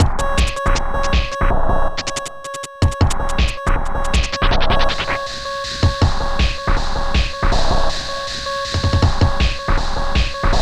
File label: Internal Percussion